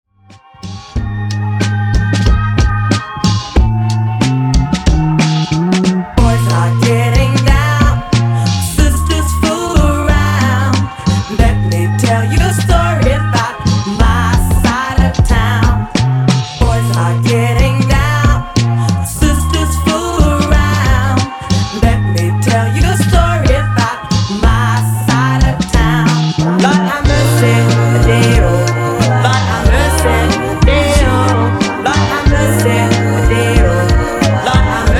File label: Mash up Extended